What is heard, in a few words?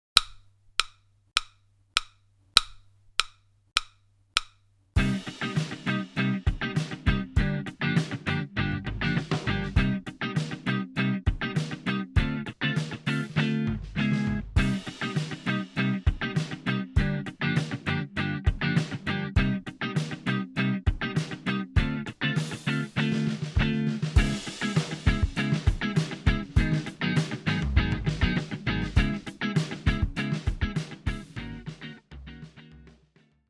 sixteenths syncopation medium